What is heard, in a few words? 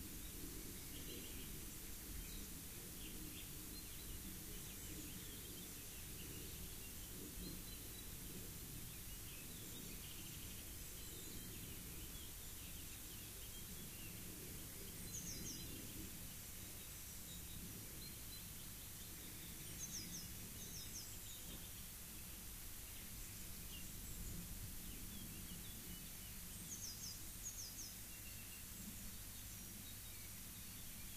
rayol farm; SEAMED